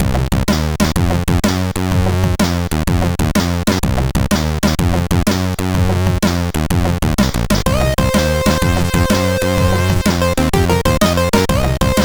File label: Stuemp Like, CSG8580R5; sid